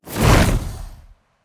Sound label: wing; smite; god cthulhu